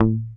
HOFNER MUTE, Bass

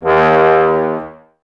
BRS TBS D#, Woodwind Brass Ensemble, Brass

